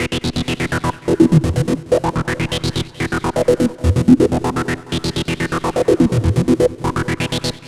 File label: Reso Bass; Dr. Fink's Funk Factory